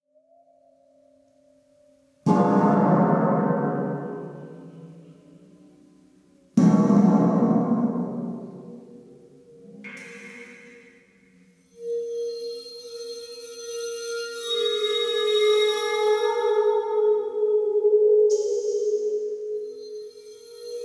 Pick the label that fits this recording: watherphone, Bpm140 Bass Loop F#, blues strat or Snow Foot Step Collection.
watherphone